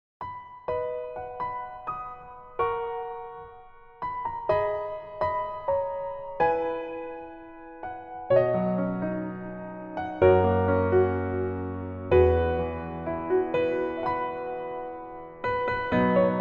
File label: Piano